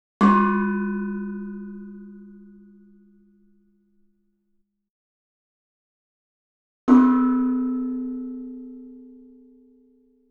Gamelan; Propeller Island - Complete Gamelan

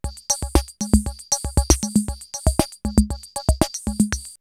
CR78 BeatBox, drumloops, DrumMachines; X-Static Goldmine

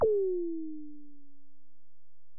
EPerc, Alesis DM-PRO; 300 Drum Machines

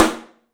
STEELS, 3D Drums, ROOM SINGLE

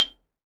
XYLOPHONE, Percussion; ILIO

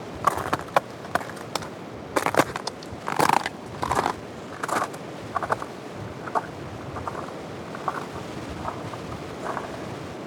Ice Steps, On The Glacier; outdoor

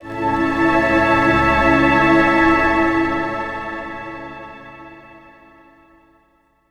Orchestralic, Pads; Sonic Foundry (Sony Creative Software); Modular Electronica

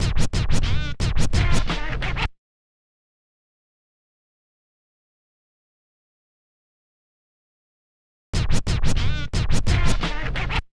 Scratching; Thats Right; Zero-G Smoke

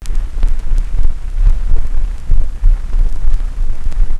atmo; Techno Trance Essentials; FX & ATMO

